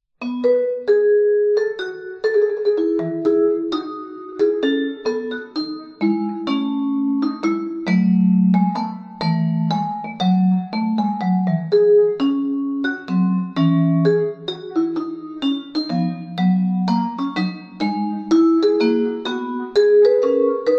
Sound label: Partita BWV 1006 Loure, Mallets; Bach for Mallets